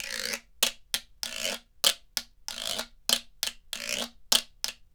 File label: GUIRO